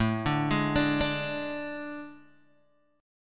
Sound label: A Major 7th Suspended 4th, Guitar, DADGAD; chord